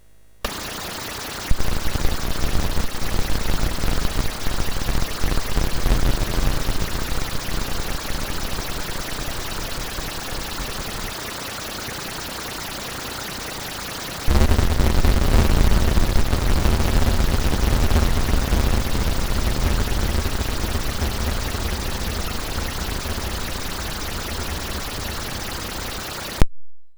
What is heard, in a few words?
Storm, MOS6581R3